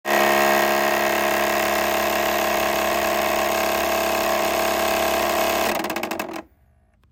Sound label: Continuous skipping